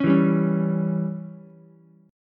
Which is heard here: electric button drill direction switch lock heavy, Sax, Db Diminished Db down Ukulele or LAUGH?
Db Diminished Db down Ukulele